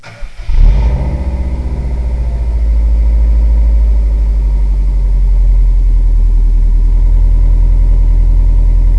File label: WRX 3 pipe sound low